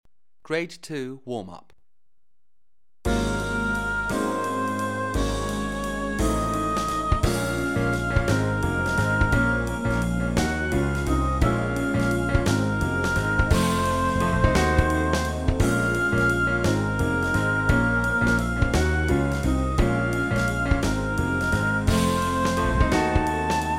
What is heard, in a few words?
Warm Up